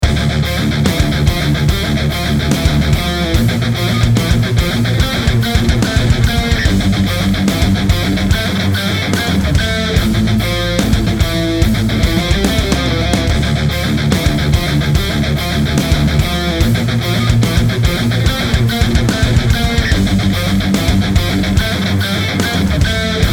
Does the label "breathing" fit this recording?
No